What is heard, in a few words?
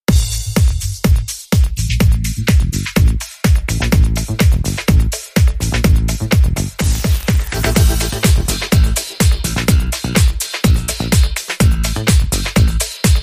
Sax It, Rhythm